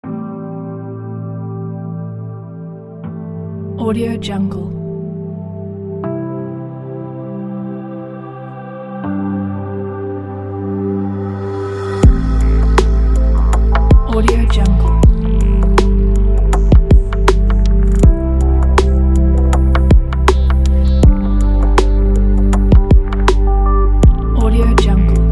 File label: abstract chill electronic pack